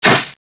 pod spit

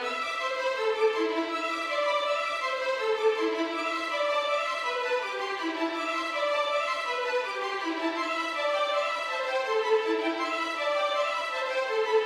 E (Violins); stero; Gemini; iOS gamerip 2016

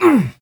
voice male hurt pain; voice male b; Infernal Radiation; PC, Switch, Linux, macOS

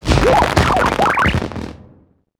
Beat

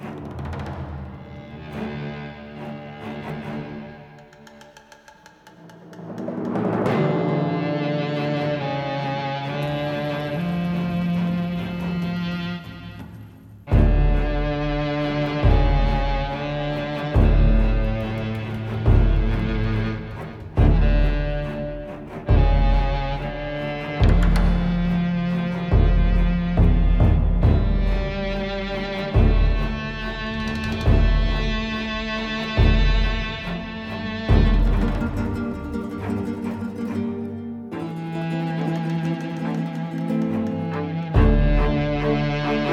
Action Chase 140bpm Low; project zomboid

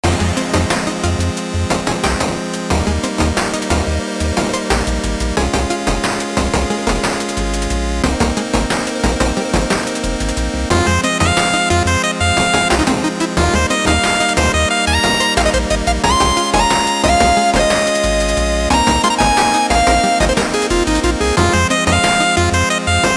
Forest Magic Song; Witchcrafty; PS Vita; Xbox Series X|S

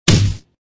Ball Sound; Extra Lives; Android

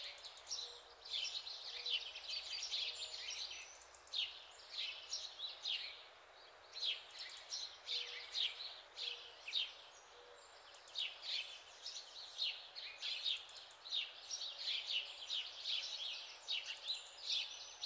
Forest

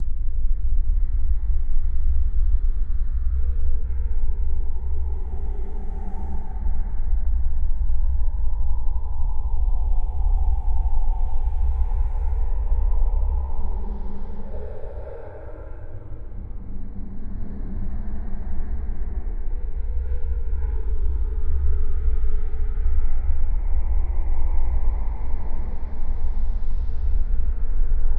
Menu Rumble

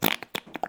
Fart; lego ninjago rise of the snakes

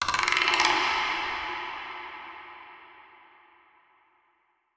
Percus; Koloro

